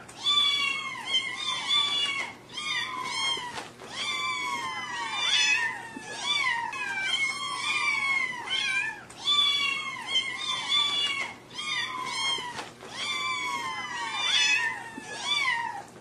kittens

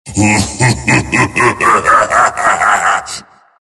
laugh; tf; skeleton king

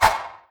miss; slender; shape

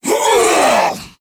attack; tf; slender; shaolinshuffle

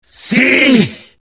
jump; freak fortress 2; Rappy Raptor

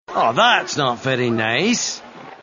death; shrek

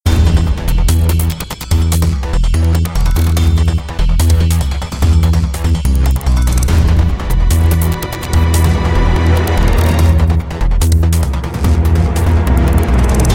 boss loop

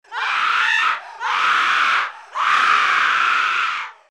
witch, zombie; dod

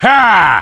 effort; wario

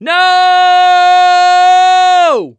fail; freak fortress 2; ellis

